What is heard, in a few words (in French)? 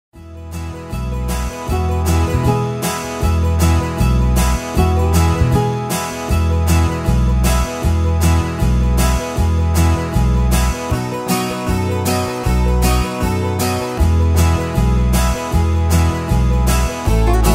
instrumental